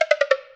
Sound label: descending